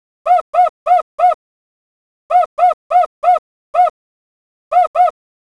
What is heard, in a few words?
far dog